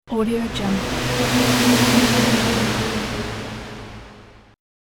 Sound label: air of motion transition; transitions and movement